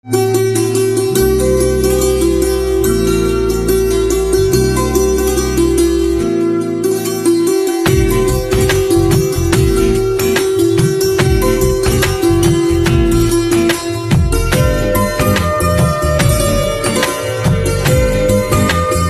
Santoor Instrumental Ringtone

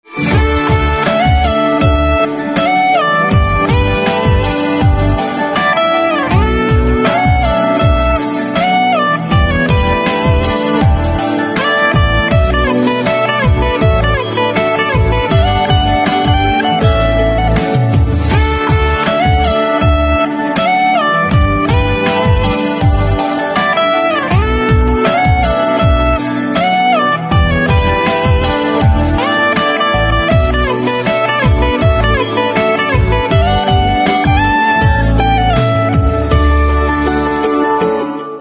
Electric Guitar Instrumental Ringtone

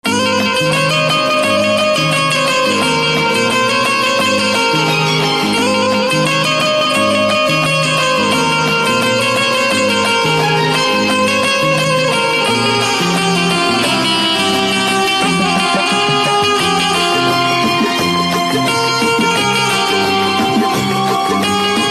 Banjo Instrumental Ringtone

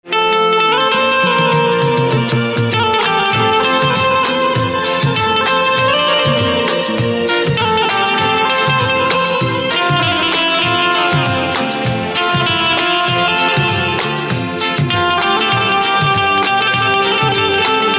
Banjo Instrumental Ringtone

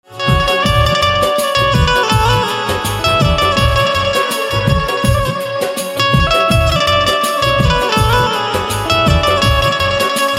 Banjo Instrumental Ringtone

